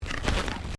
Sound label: Footsteps Carpet Step; Crocs; Footstep Foley; Footsteps (Sound Effects:Human)